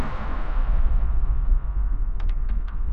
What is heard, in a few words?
door creak; sound effects